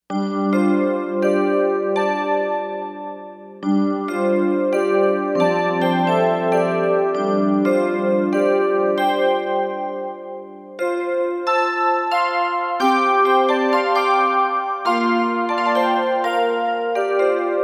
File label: Bells, Korg MSC-2S Synth; Synth 1